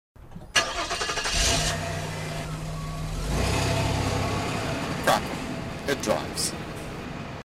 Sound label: radio; craco